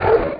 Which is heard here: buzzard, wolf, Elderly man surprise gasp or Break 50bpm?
wolf